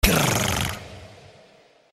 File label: grrr